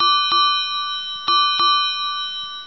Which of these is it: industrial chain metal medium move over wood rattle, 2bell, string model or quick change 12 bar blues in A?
2bell